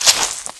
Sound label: Grass Cut